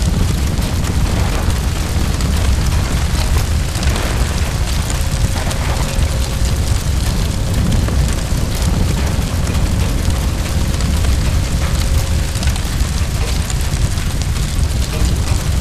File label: titan fire; Titanfall 2